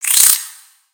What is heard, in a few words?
Spider Attack Melee; Lego; creatures mechanical